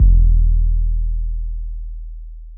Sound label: 808s